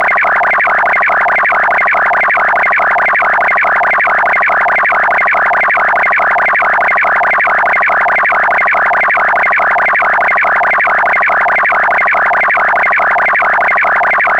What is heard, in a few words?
JT9H fast